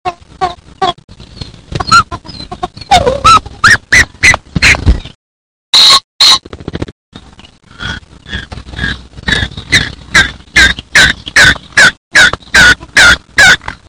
Fagiano comune

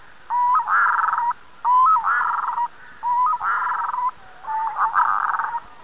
Strolaga minore

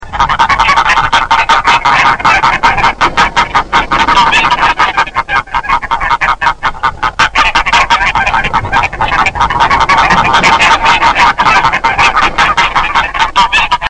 Oca selvatica

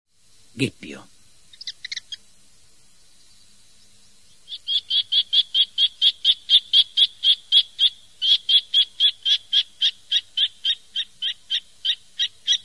Gheppio

